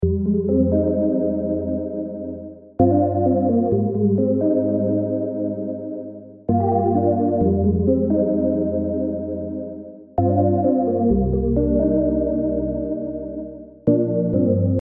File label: Cm 130 BPM, R&B MIDI Melody; Dusk; R&B MIDI Melody Collection